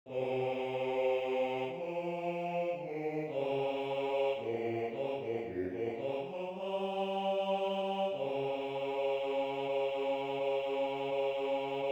bass

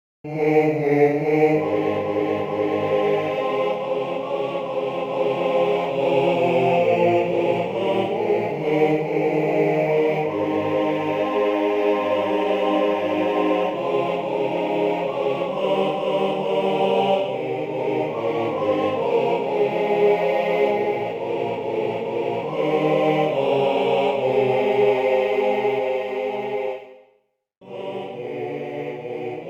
chorus

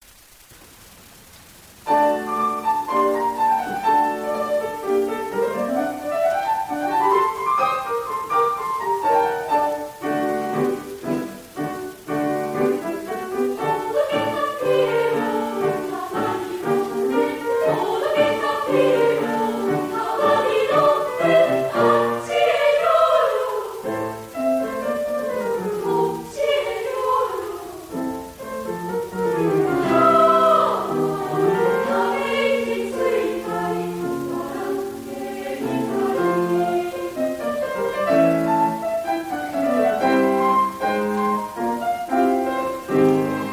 chorus

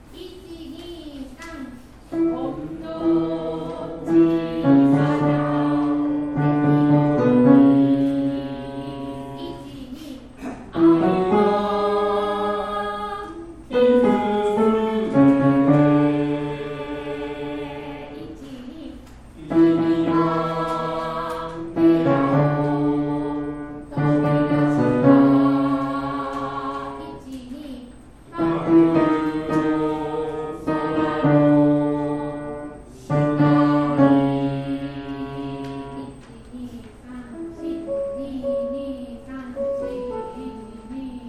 bass

